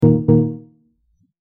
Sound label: error alert